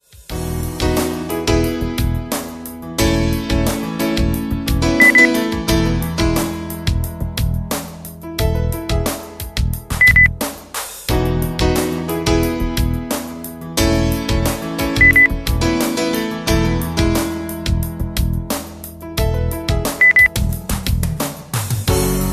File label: pop